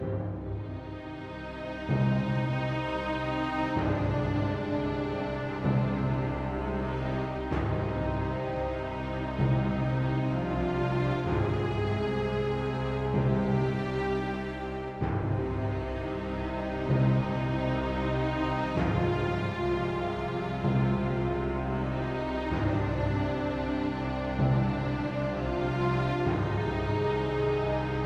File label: Music Segments Walk Big Cold 64bpm Fadein Loop; asterix obelix slap them all 2